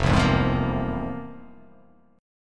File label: F-Minor Major 11th, down; FmM11 strummed; JGuitar